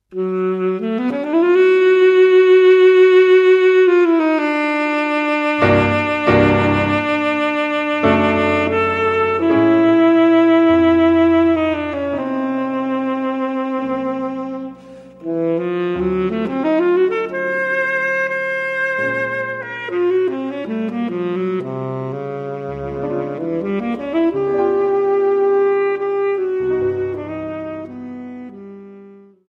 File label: Interlude